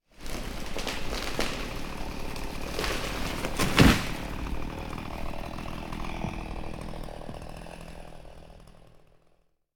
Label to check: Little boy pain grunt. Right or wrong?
Wrong